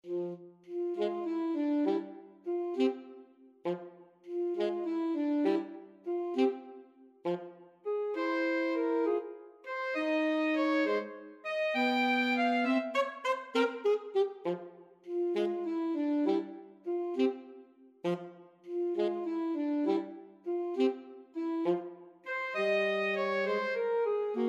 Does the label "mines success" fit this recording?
No